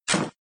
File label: btn Standard close Pop Up; slots